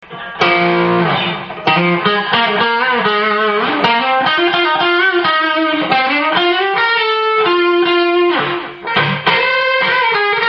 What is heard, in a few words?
tube